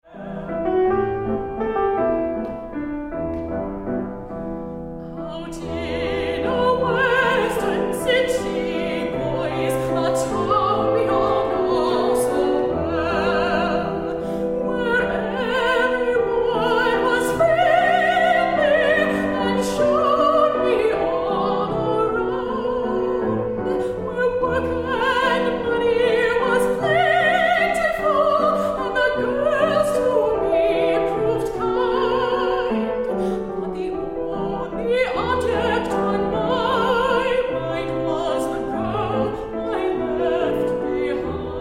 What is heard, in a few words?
Waltz; bigbonanza